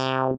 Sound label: noise; crakbone